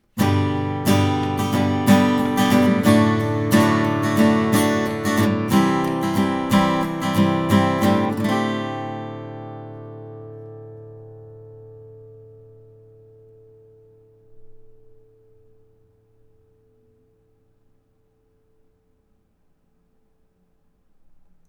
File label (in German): a-gitarre; oktava